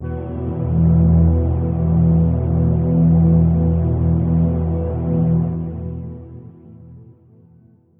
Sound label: triad, Synths; Notes